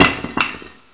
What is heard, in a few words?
marto